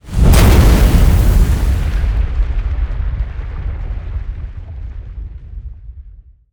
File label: sandstorm impact